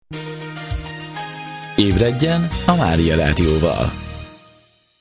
Reggeli Jingle; REGGELI MUSOR SZIGNALJAI; STUDIO GYULAFEHERVAR

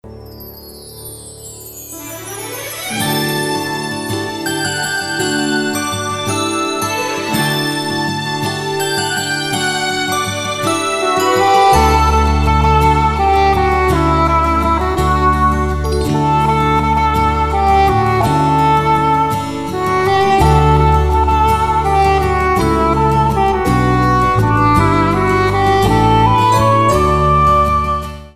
esti mese vege szignal

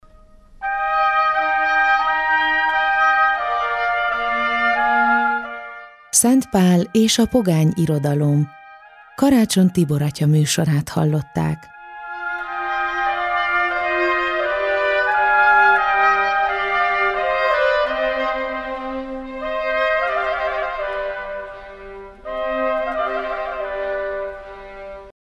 JINGLE VEGE; Szent Pal es a pogany irodalom

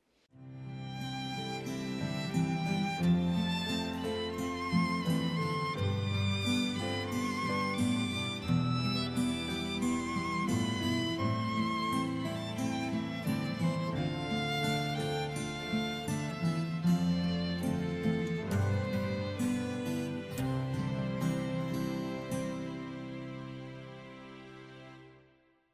SZIGNÁLZENE; Hit, kultúra, tudomány

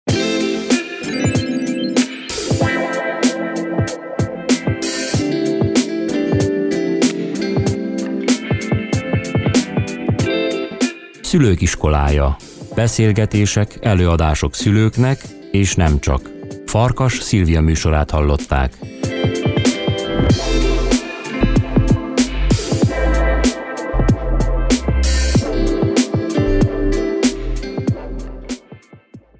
VEGE JINGLE; Szulok iskolaja

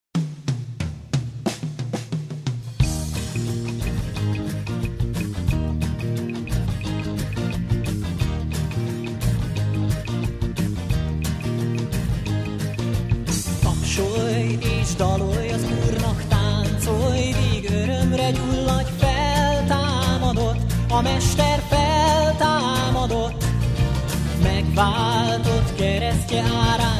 Husveti Jingle; Husveti Szignalok

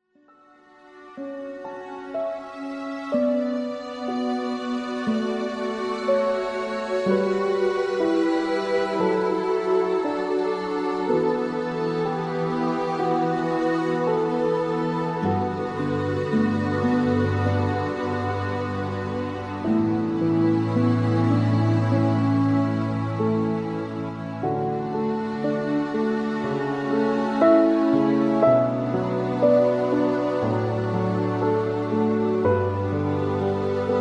Instrumentális mix; IMATARSOLY-ZENE

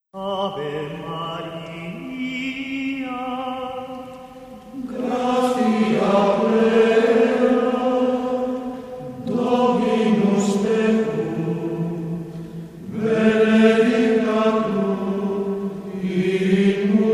Gregorian (ferfi korus); Ave maria; Adventi enekek

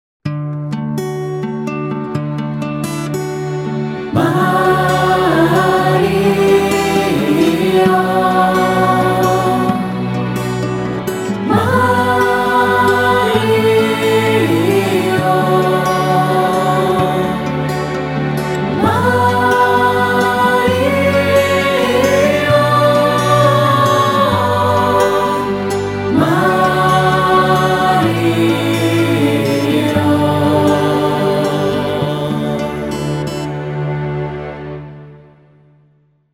Radio Szignal zeneje (szoveg nelkul)

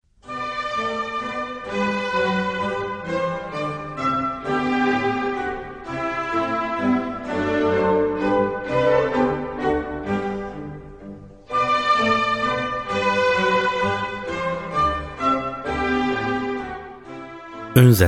Pénzkérő Jingle

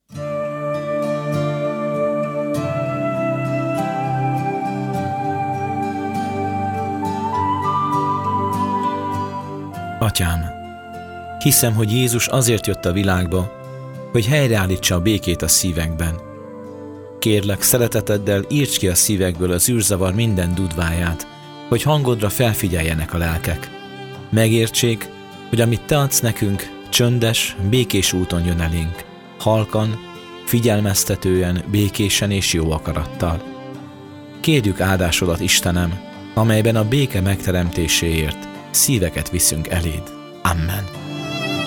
Jingle; Beke Rozsafuzere

